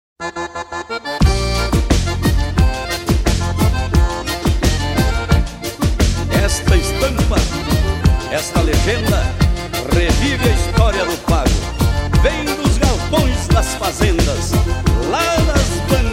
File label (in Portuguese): batidão gaúcho sem vinheta